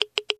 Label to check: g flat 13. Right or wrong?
Wrong